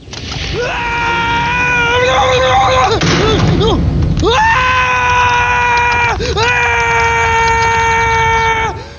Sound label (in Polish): krzyk